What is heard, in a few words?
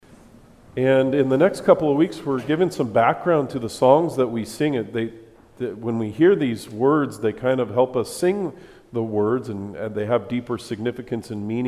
SONG BACKGROUND